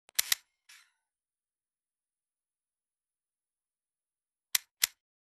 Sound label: camera photo flash with